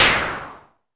mbrute noiz, Arturia MiniBrute

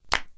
clap real; drums; single hits

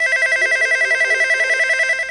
electro Phone Ring; Weird and Interesting Hits; single hits

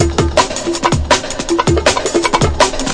162 war dance, drum loops and breaks; 161 to 180 bpm

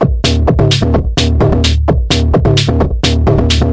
129 grungy lectro, drum loops and breaks

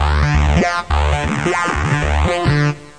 bigbeat, SYNTH AND ELECTRONIC

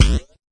fx drum

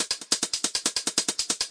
140 bpm, verby hat samba, drum loops and breaks

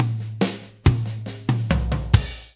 six eight Fill, DRUM LOOPS and BREAKS

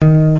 natural bass; Basses

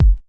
rounded edges kick; Kicks; single hits

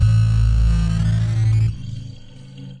ascending distorto bass; Basses

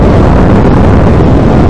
thrust, video games; solarq

